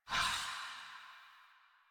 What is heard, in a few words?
Generic Footstep; Warframe; Choir; Foley